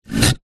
household chair wooden large heavy 1970s move drag scrape hard ground